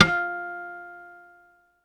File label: guitar F, GUITAR STEEL; ProSamples vol.55 - Retro Sampler; MIRAGE